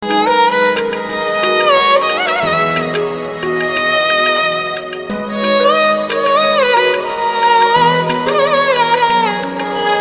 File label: bangla music